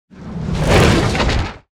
car debris; factorio 2.0.55

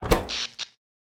electric network open; factorio 2.0.55

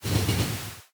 train tie; factorio 2.0.55

